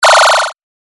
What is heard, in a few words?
lazer